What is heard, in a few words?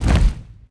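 stone hit stone medium; objects; Star Wars Obi-Wan; XBOX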